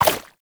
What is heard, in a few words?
Baby Jump; Warframe; Nokko Colony; Visions